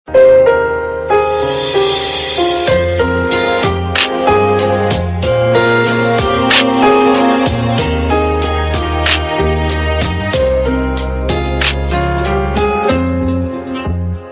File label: Instrumental Tune; TV Serial Tone; Channel V; Mastaangi